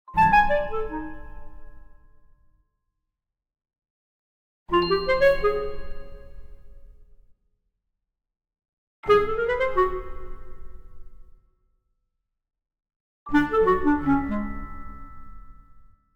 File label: Orchestral Jingle Negative; boom library; The Interface